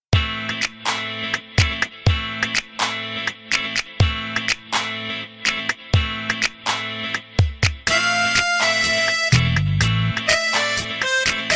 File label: tango